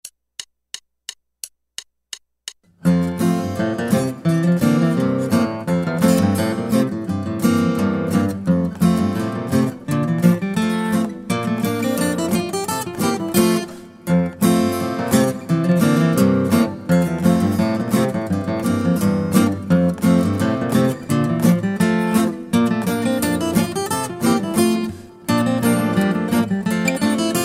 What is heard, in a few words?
Guitar